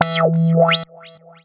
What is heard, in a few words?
Bonus